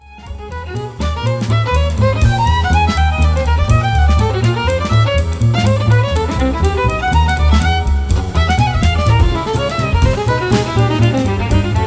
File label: Jazz